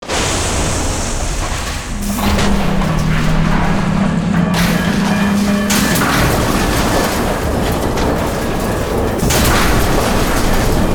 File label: Explosive; The Producers Library